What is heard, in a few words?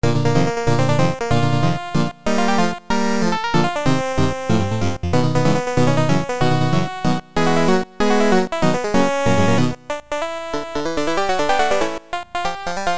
Main Music; The Tower of Druaga; TurboGrafx 16